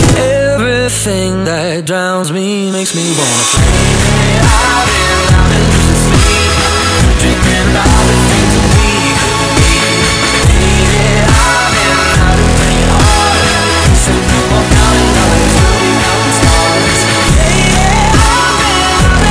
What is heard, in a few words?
dubstep remix